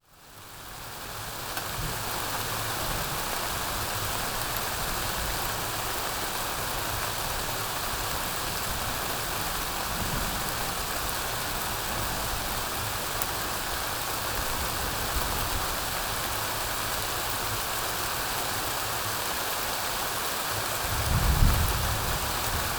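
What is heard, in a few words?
sounds of a summer thunderstorm, nature sounds; zvuki letnei grozi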